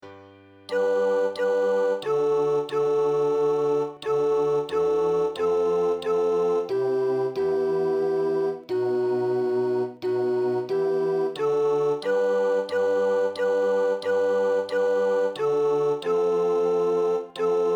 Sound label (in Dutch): Koester de namen sopraan